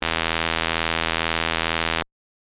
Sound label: mix SAW